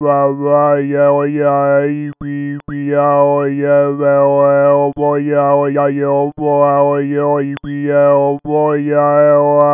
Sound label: babble